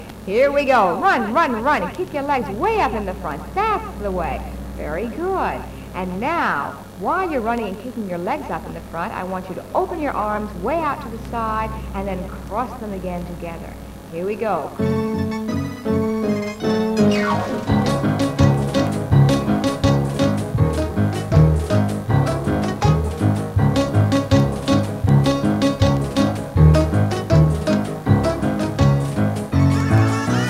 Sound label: electronic; ミシシッピ・レコード; 1969年に発売された極めてサイケデリックなモンド作品